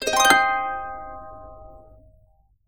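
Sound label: harp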